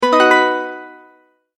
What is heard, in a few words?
multimedia tone advance